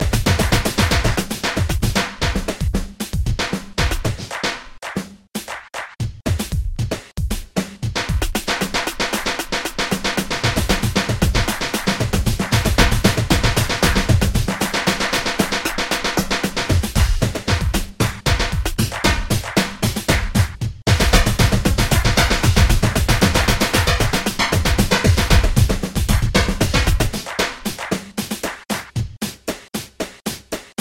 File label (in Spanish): Ritmo Hydrogen